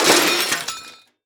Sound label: Crash Metal And Glass Debris Shuffling On Pavement; crashes; AdobeCC